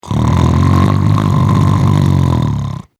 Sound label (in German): vox schnarchen